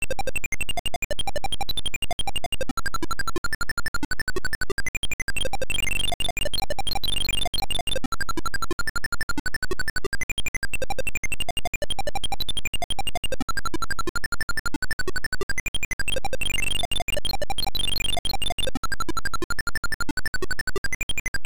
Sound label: Title, Beeper; Krakout